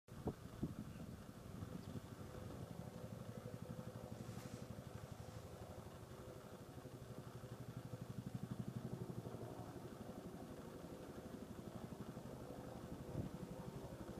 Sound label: U.S. Marine AH-1Z Viper attack helicopters; US Military Videos